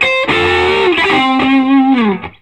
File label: SOLO, Vintage Blues Guitar; USB Soundscan vol.22; AKAI